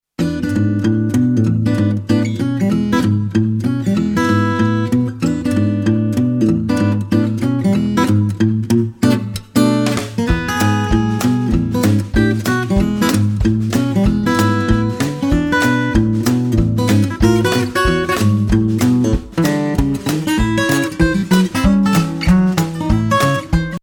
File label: Boo guitare